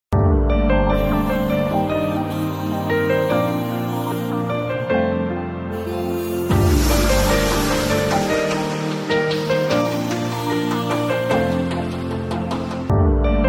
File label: Fashion Instrumental Ringtone